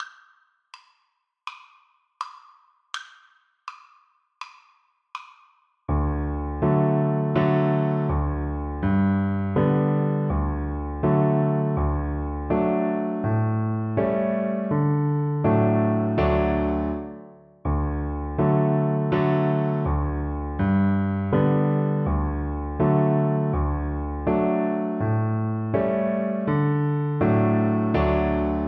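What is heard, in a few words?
viola karaoke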